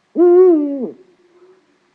hibou grand duc; environnement

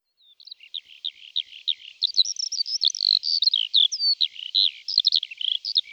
alouette des champs; environnement

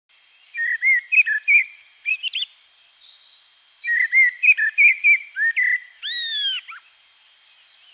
merle noir; environnement

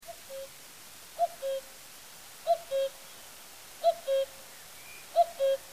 coucou; environnement

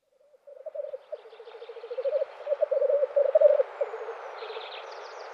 tetras lyre; environnement

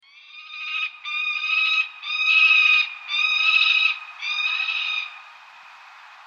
faucon pelerin; environnement

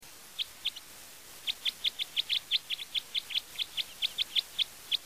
bec croise des sapins; environnement

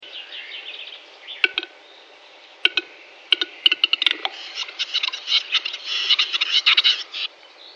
grand tetras; environnement

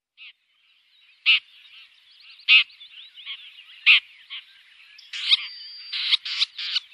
pie grieche ecorcheur; environnement

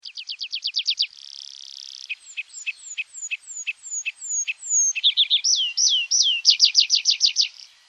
pipit des arbres; environnement

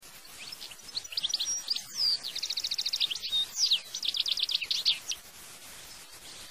chardonneret; environnement

